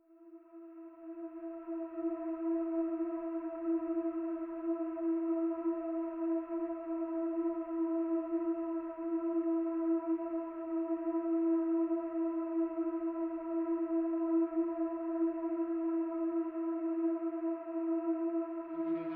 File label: no guitar; Game-On; ernst